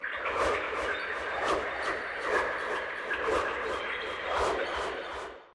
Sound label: Link Warning; smite 2; Aphrodite